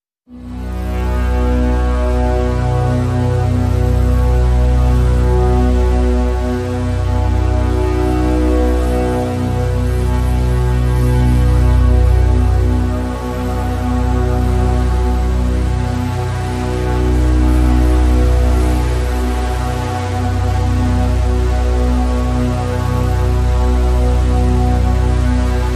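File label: Drone Bowed Bass B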